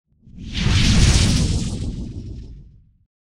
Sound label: Epic Swishes; SwooshSounds-2